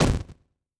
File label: death explosion